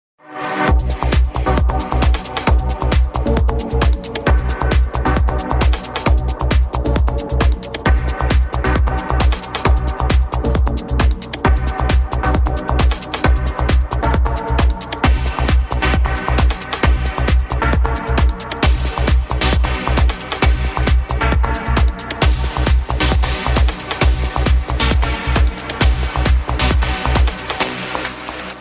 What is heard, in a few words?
Trance